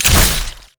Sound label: basic attack; orianna